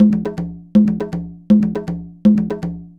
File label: Conga, Latin Percussion